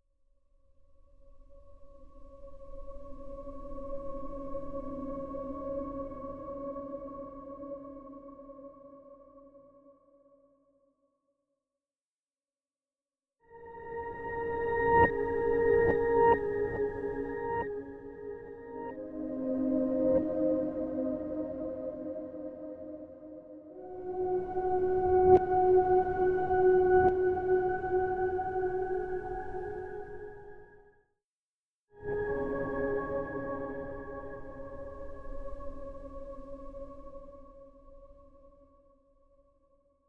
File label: twinkle twinkle little star reverse